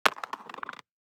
DICE on Cardboard, Throw and Roll, Standard, Two Dice; Samples; ArticulatedSounds; DICE Sfx Party (Extended)